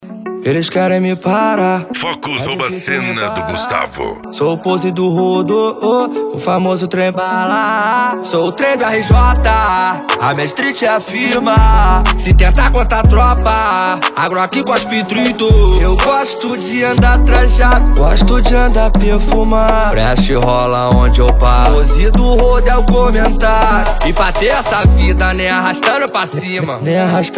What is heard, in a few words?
trap e funk